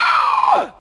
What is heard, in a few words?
"oh" inhale